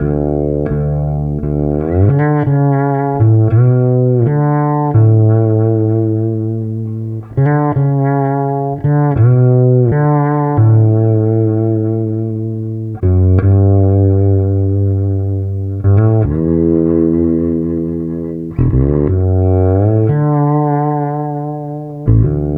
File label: Bass; Sonic Foundry; Not Just Another Pretty Bass